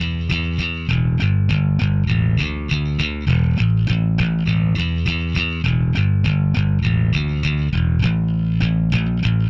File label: Indierock Bass Dmin; Pro Tools Essential Demos; Essential Loop Demos; Demo Session - Rock Hard; Digidesign